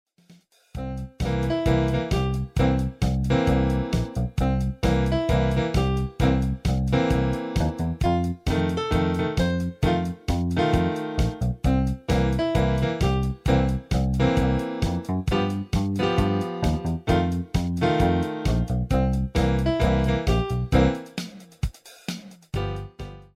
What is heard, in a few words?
Rock